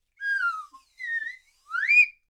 slide whistle

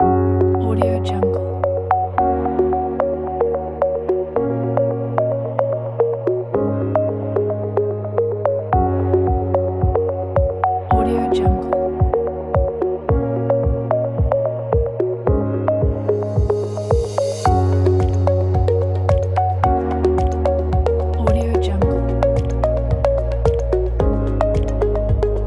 science soundscape

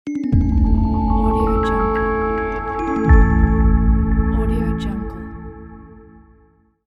soft bells logo; kirillluzin

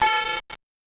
noise glass distorted various; soundmorph

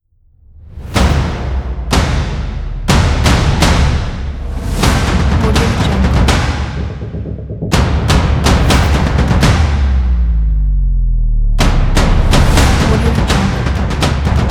action drums

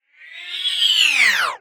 pewhoosh

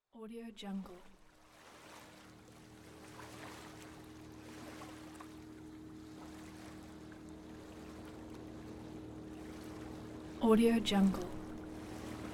boat passing by

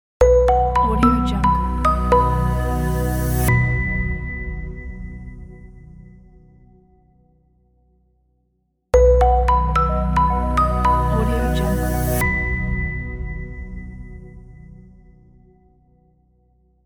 corporate logo

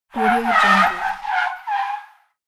car braking skid

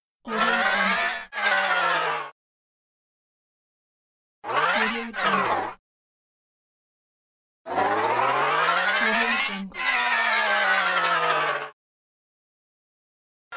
robot movement

